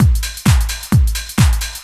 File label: drum 130 trance; Techno Trance Essentials; DRUMLOOPS